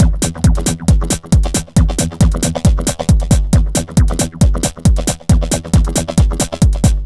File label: Dance Drumloop; Drums; Dance Beats